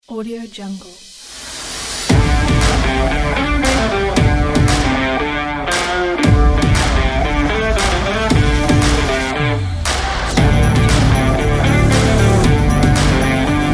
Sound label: funk rock